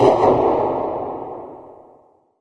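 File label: distant pistol; random amb sfx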